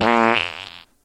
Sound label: fart short